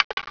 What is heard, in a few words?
drop item; arcade; gubble